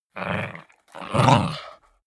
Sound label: chihuahua snarl fight soft; natur-tiere; hunde